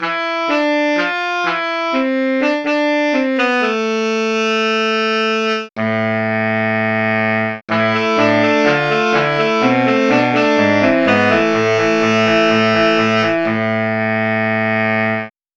tenor sax

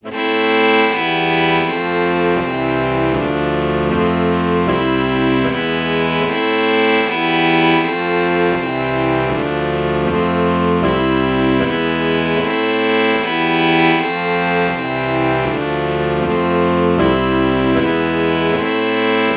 alto saxophone violin chord morph

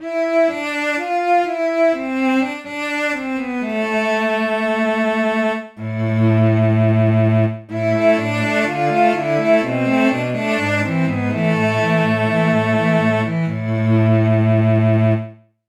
cello

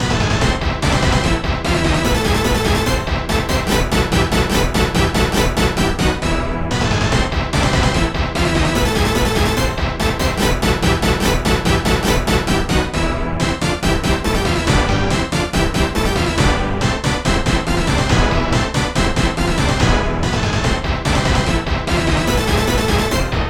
orchestra hit

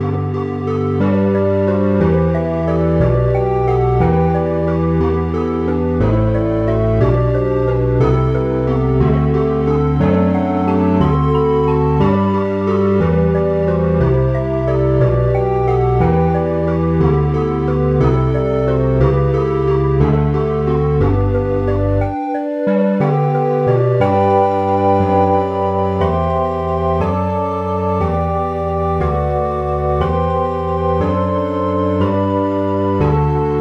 ocarina bach

